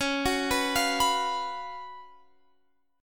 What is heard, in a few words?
F sharp Major 7th Suspended 4th C sharp